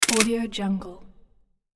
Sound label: camera antique shutter click; hollywoodedge